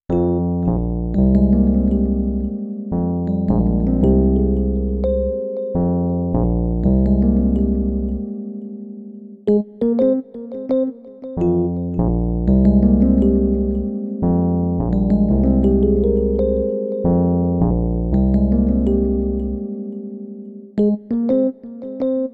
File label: Melody, Hip-Hop